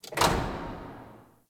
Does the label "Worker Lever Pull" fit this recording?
Yes